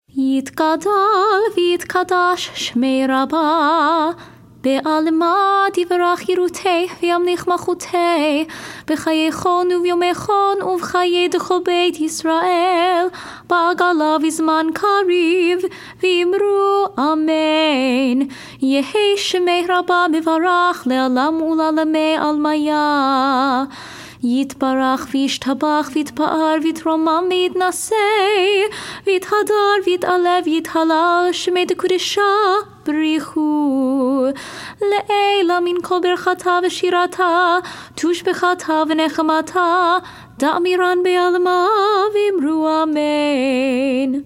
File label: hatzi kaddish nusach minor